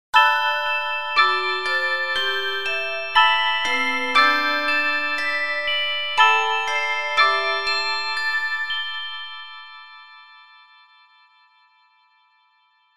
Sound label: chime